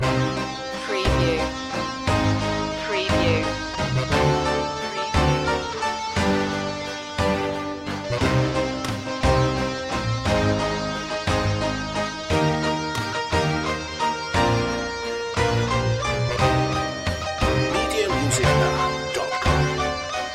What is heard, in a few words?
electric guitar waltz loop